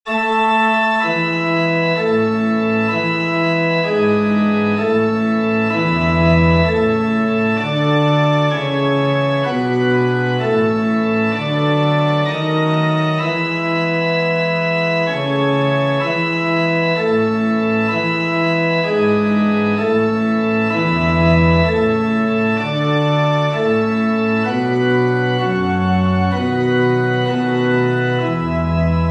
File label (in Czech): hymna bas